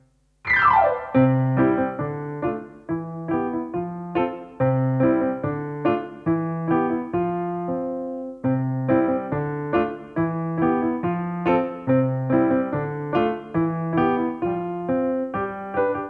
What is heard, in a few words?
Hopping Piano